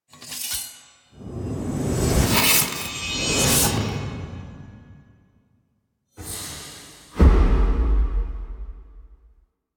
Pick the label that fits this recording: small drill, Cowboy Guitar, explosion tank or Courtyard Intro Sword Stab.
Courtyard Intro Sword Stab